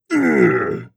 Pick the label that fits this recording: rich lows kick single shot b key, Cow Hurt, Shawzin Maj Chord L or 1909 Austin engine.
Cow Hurt